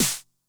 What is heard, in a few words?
Snare Drum, Low Tune Med Tone, 909; classic machines; 909mod